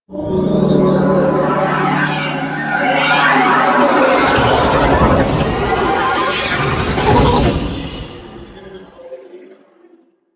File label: Insect Liset Extraction Depart, Vehicles Flight